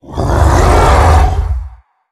咆哮声; 劫掠兽

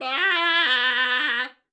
山羊尖叫声

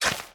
锹平整土地声

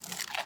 熊猫进食声

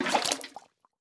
美西螈装入桶声

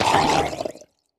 水中死亡声; 溺尸

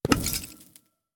宝库弹出声

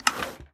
织布机选择图案声

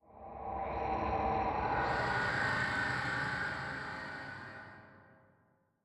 诡异森林呼救声; ambient

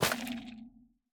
幽匿脉络破坏声

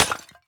饰纹陶罐碎裂声

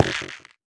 菌柄踩踏声

